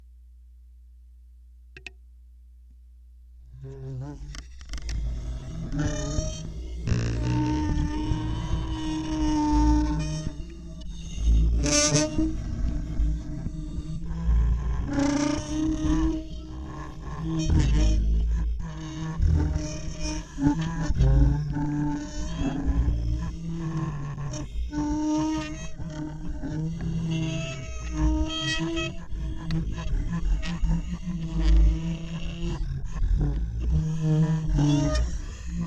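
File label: daxophone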